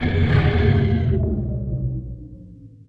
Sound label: reactor power decrease